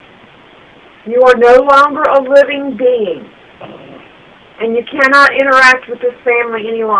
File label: grunt, evps